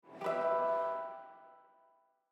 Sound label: harmonics